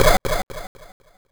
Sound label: hardcore echo bass; OSWorkshop; .microsound repository